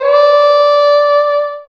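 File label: HORN delay; East West - Phat & Phunky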